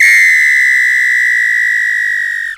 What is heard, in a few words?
WHISTLE LOW, BRAZIL